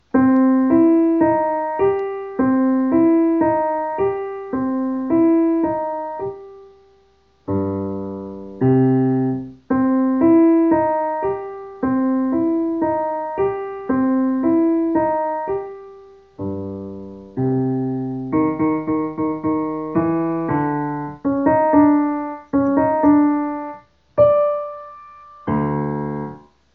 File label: instrumental; Halloween - Spookie heeft twijfels